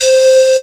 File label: Casio CTK-611 Blow Bottle C5